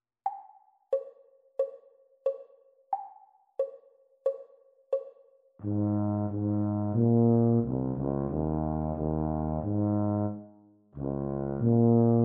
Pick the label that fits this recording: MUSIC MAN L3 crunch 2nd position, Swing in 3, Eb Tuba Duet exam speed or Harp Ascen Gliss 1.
Eb Tuba Duet exam speed